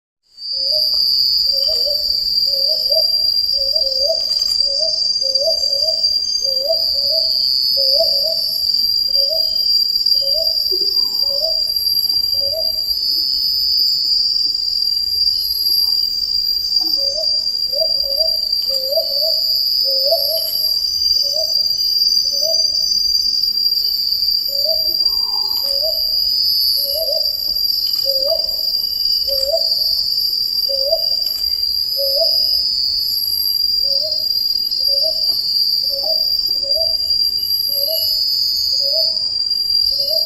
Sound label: Brown Hawk Owl; KCTsang